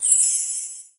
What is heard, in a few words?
Menu Magic